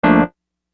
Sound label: Whirly; Construction Kit; HIP-HOP; Sonic Foundry (Sony Creative Software)